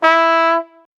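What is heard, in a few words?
trumpet signaling readiness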